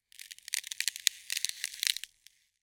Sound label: nature thin ice crack under pressure